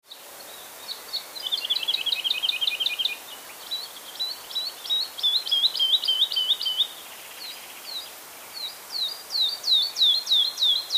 Tottavilla, uccelli

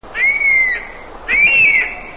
Falco pecchiaiolo

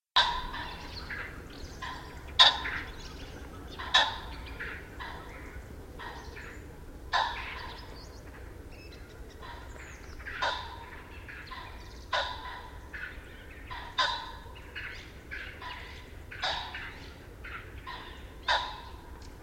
Fagiano

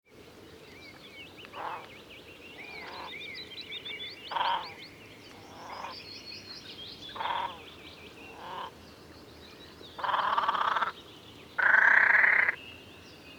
Svasso Maggiore

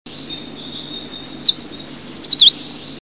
RAMPICHINO ALPESTRE Certhia familiaris

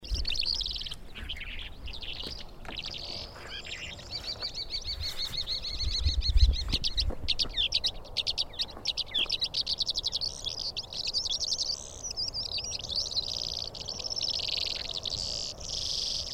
Calandra, uccelli

